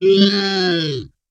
Monster Whine Pig

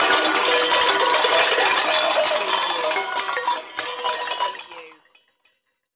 Crash Pots and Pans

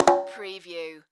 Multimedia Percussion

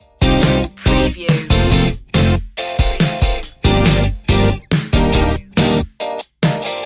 full city loop

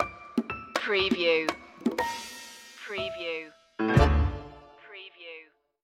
tango de luna stinger

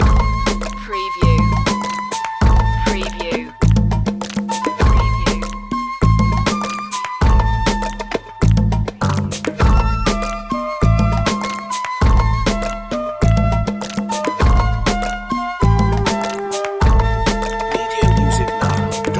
bollywood beat loop

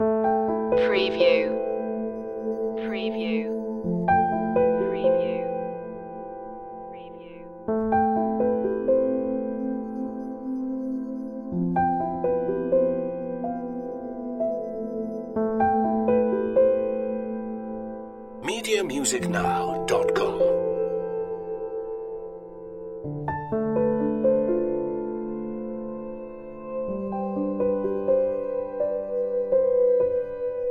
a glow loop

